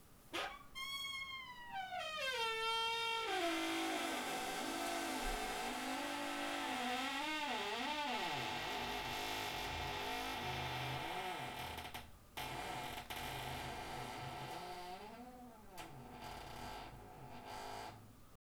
Porte qui grince; Bruitages

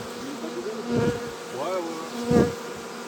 Vol d abeilles 3x passages AC doux; Vols Bourdn abeilles

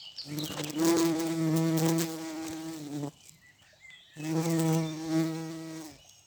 Bourdons nerveux; Vols Bourdn abeilles

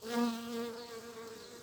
Abeille Osmie se loigne vol Moy; Vols Bourdn abeilles

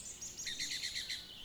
5x Truit, Oiseaux

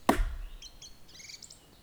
1x Coup clair oiseau, Percu Bois

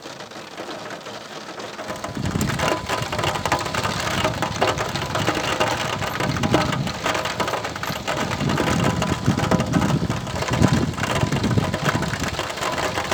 Pluie début sur velux; Bruitages

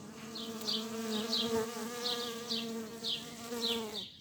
Abeilles multiples; Vols Bourdn abeilles

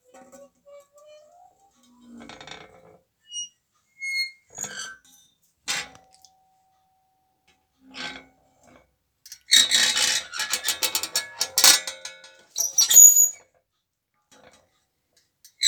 metal grincements; Bruitages

